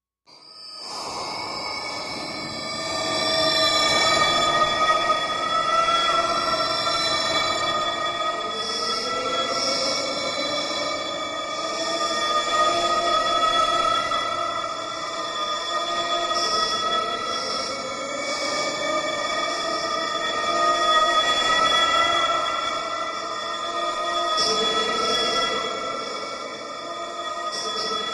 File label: Siren Breath Alarm Brea, horror